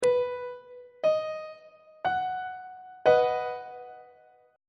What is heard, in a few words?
b major chord on treble clef